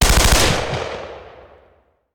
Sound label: Machine Gun; Sound Ideas; Guns